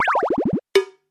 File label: poor; UI